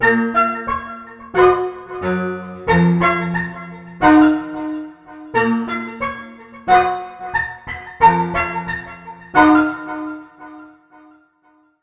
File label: Trap Melody Loop 90BPM Amin; Cartaplena Beats; Drip